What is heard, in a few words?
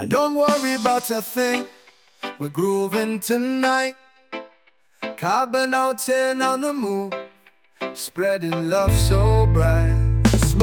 reggae